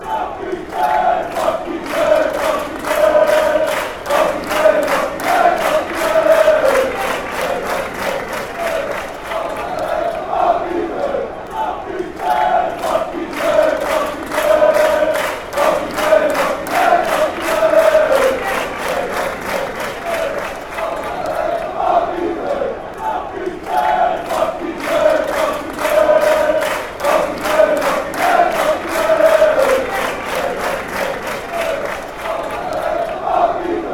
den haag chant

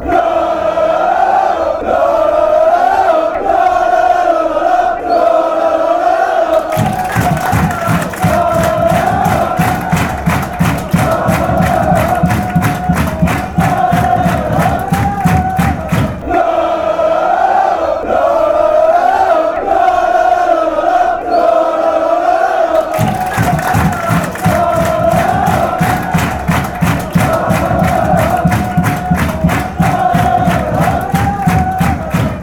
fan chants

